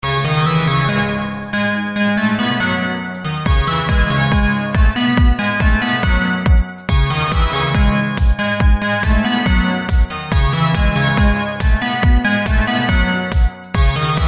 Happy Ringing

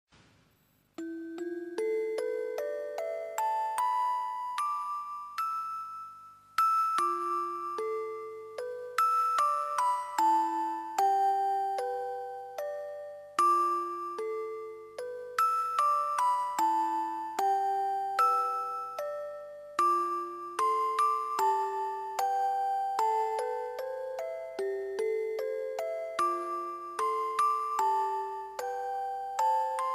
Music Box; Naruto

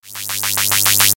Electricity

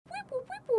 Beep Boop; Amelia

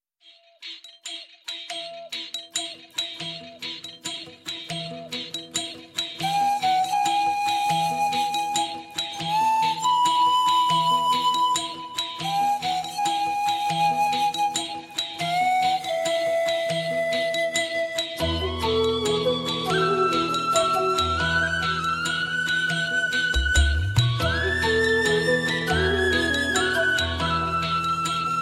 Bells Flute

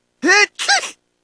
Sneeze

